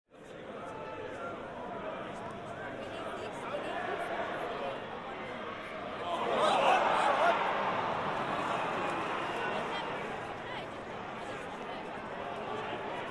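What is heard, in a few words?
English Sports Crowd Professional Football Match Stadium Ambience Fan Reaction; Echoto Sound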